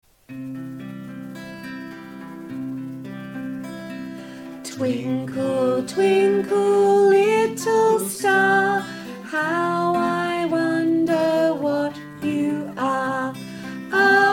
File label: twinkle